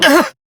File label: Pain, Male; Warframe; Railjack; Perrin Sequence